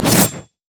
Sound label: impaling charge leap; LoL; hecarim